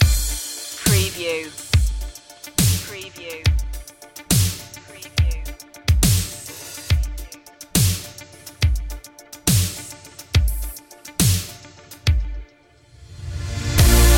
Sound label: trip step dub step